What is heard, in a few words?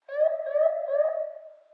Ambience Police Siren; Subway Surfers City; Android, iOS